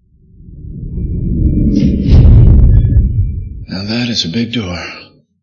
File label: big Door; Now that is a big door.; Exclamations; Doors; TRON: Legacy; Garrett Hedlund (Sam Flynn)